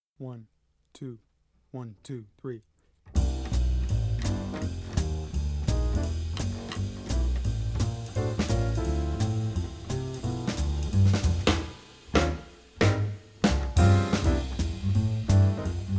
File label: Birds Backyard rhythm only